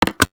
mag hit; spasi15; tacz; daffas arsenal

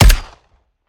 sup, hk416; tacz; daffas arsenal

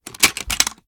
cover open, mg3; tacz; daffas arsenal

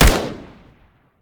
last; tacz; daffas arsenal

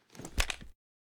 inspect; p99; tacz; daffas arsenal

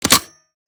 bolt close; spasi15; tacz; daffas arsenal

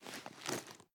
cloth, hk45; tacz; daffas arsenal

